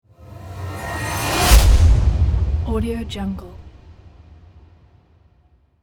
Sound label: trailer sound effect